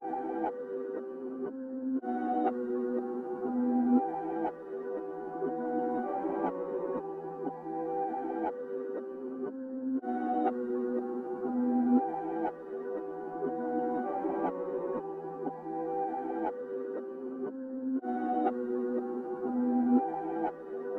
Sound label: 120 BPM E Min Reverse Keys; Melody Loops; Loop Stems; Hip Hop; Cymatics; Hibiki